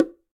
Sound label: Bongo B L Heel; Latin Percussion; Drums; Drum Hits; Ableton; Factory Packs